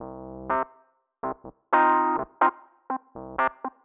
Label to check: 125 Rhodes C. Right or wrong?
Right